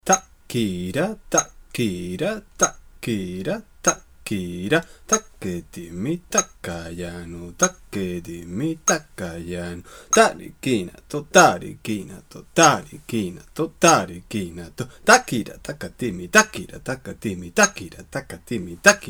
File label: gatis 4 beats